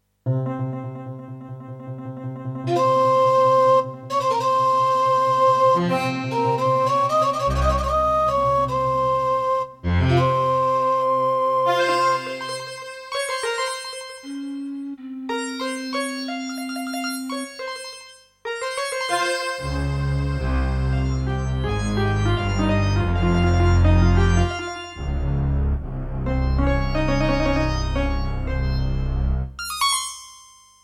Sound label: Casio CTK-2100; Prince of Persia 2